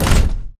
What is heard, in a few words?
Footstep Jog Close; Titanfall 2